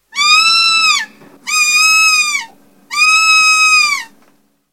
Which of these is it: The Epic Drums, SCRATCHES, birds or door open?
birds